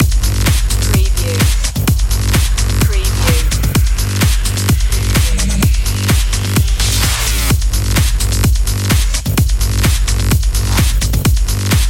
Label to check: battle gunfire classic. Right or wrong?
Wrong